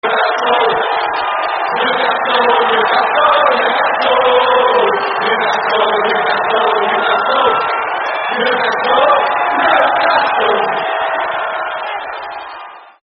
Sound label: And Claps No Cheer, terrace chants; Newcastle